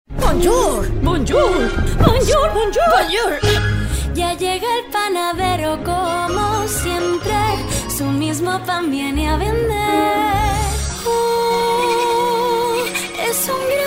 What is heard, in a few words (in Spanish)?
Canto; Varios estilos